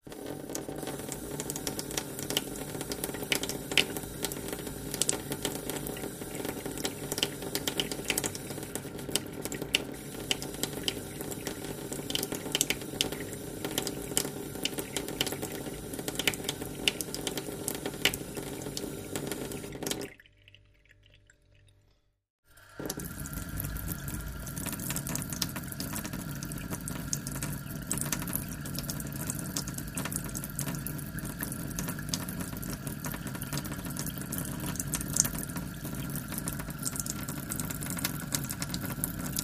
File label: Wash Basin Metal, Trickle Into The Trap x2; Wash Basin Aluminum Thin Stream x3